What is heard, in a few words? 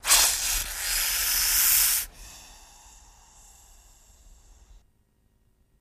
Snake Hiss, Breath